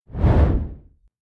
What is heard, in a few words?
smoke Clean